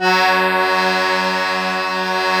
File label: KEY Cajun, Accord Trio; Club-50 - Foundations Roland; Accordians